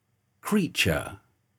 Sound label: creature